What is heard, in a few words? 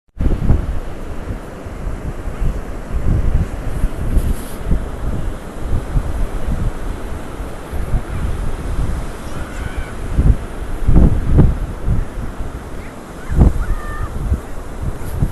moeraki boulders beach